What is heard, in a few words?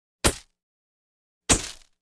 Two Large Flash Bulbs, flash pops